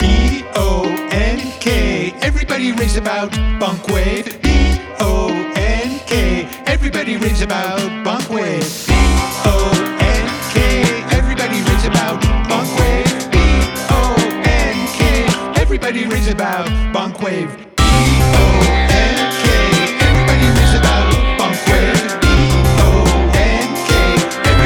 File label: Bonkwave Cheer